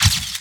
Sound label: screenshot